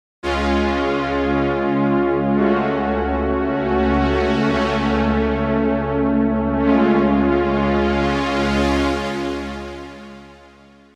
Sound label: Strings